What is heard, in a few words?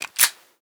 m92 slide shut; Rust